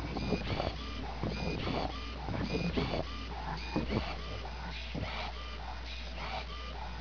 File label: whine